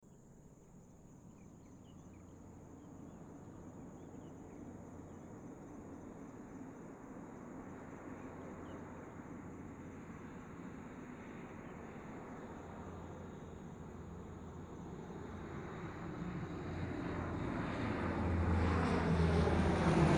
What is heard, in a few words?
C130 OH stereo